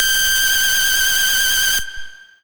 lead

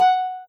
soft hitwhistle; osu

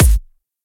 bass drum

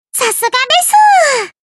koto

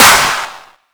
normal hit clap

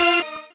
normal hit normal

